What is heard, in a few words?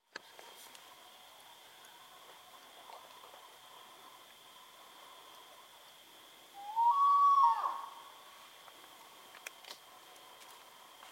Spot bellied eagle owl